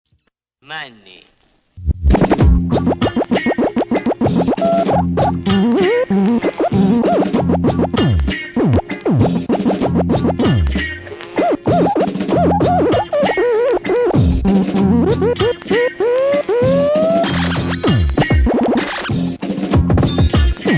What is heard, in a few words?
Extended Scratch